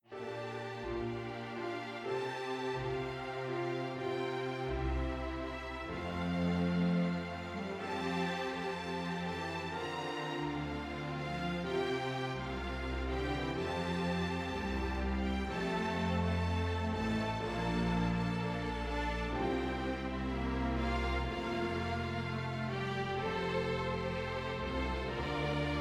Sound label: African Stroll for String Orchestra and piano